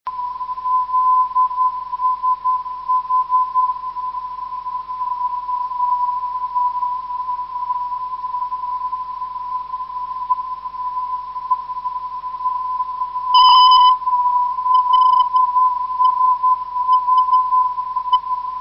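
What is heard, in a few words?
340kHz, non-directional beacon; lsh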